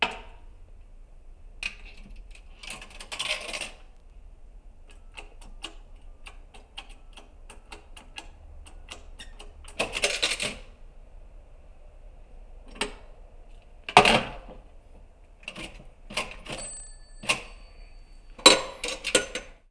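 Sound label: pay phone; Media-Pedia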